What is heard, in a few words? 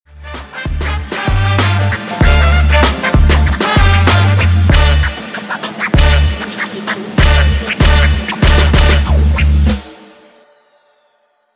outro music